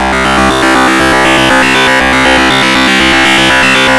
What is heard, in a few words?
GRUNGE